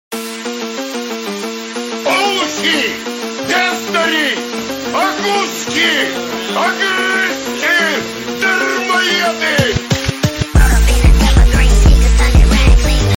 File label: fonk